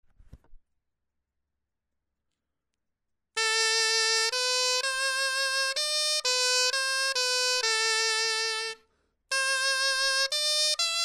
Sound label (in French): clarin etude